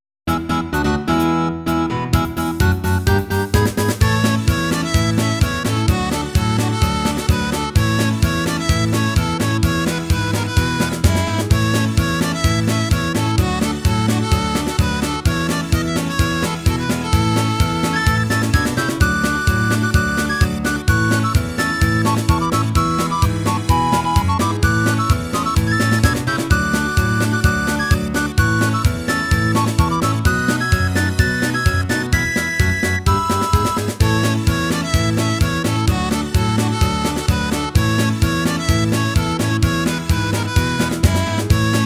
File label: Minigame Race; Harvest Moon Back to Nature